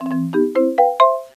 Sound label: Start; Kantan Tanoshii Okashi Navi